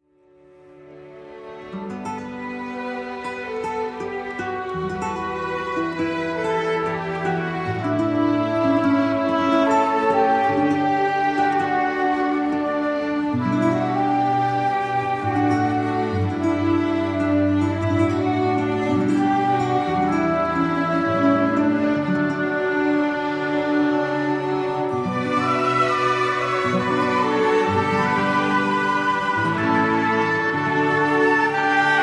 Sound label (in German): Gitarrenkonzert in D, Zweiter Satz, Allegretto; Instrumentalkompositionen